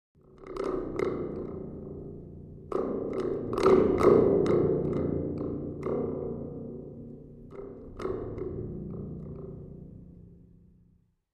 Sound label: Spring Drum Sml